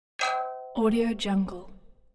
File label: gentle angelic confirmation sfx